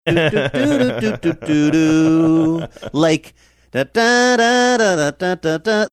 singing bits of

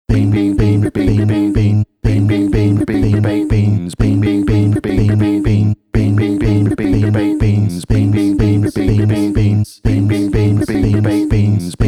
baked bean song ringtone

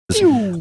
making laser sharp shooting sound

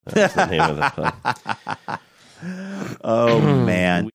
chuckling saying oh man

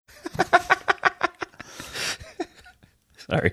laughing saying sorry

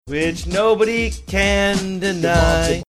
singing which nobody can deny

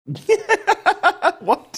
laughing saying what

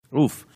"oof"

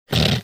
snorting

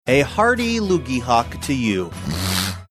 hearty loogie hock to you snorting

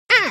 cawing like crow

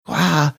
waaha

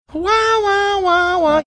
singing wah wah wah wah

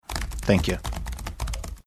furiously typing saying thank you

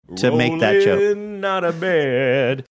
singing rollin out of bed

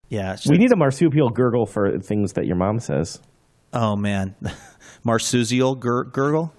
marsupial gurgle

